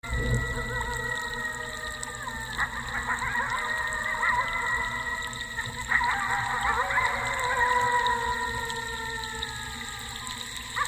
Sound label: wolf hunting